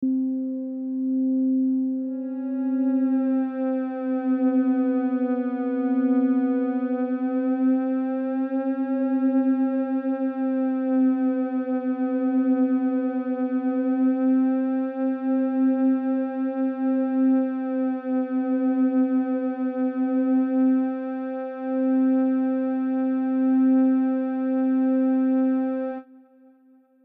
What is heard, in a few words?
Matching Pitch